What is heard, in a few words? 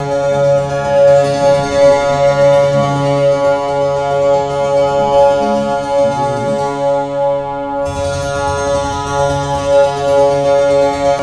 monochord